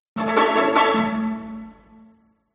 MUSICAL ORCHESTRA Jingle Strings Pizzicato Friendly; smartsound